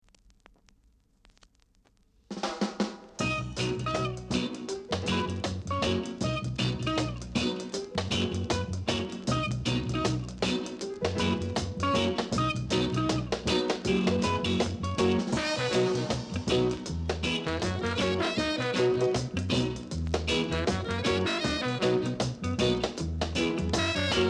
beat

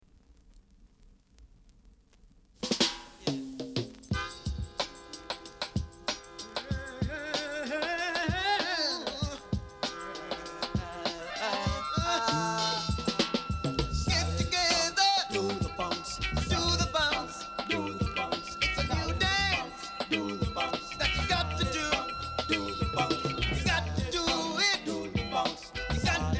funk

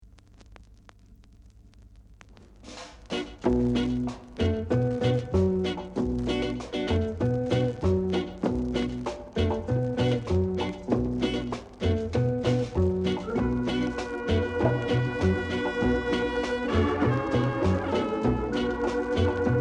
drum

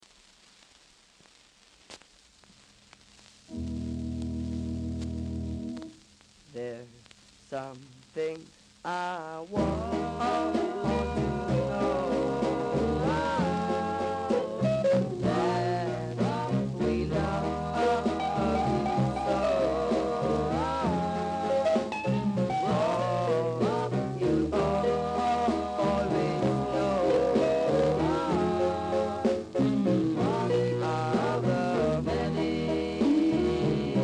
blues

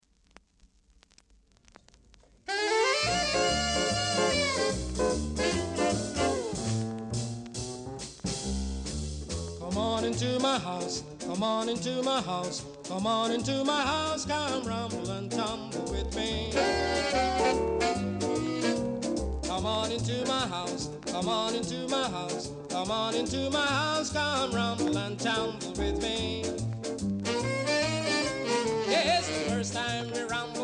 blues

